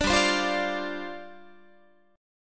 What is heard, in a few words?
G 7th Suspended 4th G down Guitar; Listen to G7sus4 strummed; G7sus4 Chord